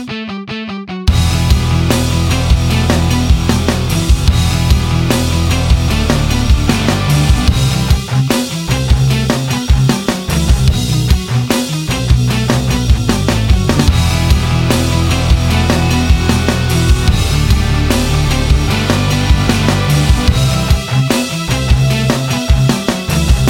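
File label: Electro Beat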